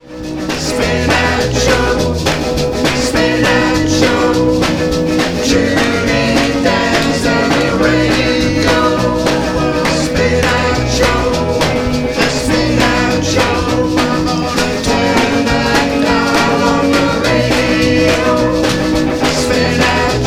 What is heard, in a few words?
Jingle Song No Vox